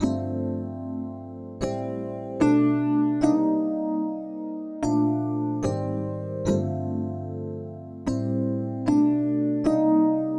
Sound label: Keyboard